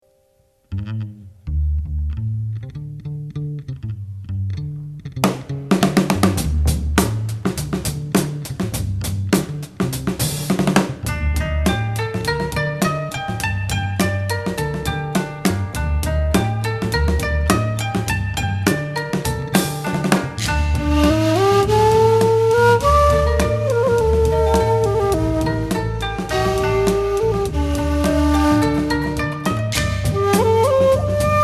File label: FUNK